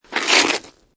Snow And Ice Ice Scrape Slide; Sound Effects:Weather

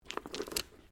Dice Small Game Board Pickup; Variety of dice sounds; Casinos

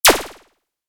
Resonating Hit; Tech; Stuart Duffield

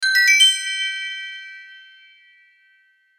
chime arpeggio bright bell; Multimedia; Chimes; Blastwave

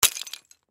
Clay Pigeon Break, Clay Pigeon Break Shatter; Miscellaneous Sport; Sports

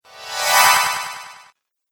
Casting Spell, Magic Sound Effects

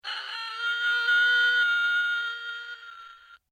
Toy Wolf Howl, Strange Toys; Miscellaneous Toy; Foundation4

